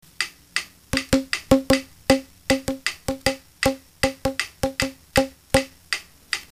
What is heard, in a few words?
Rhythm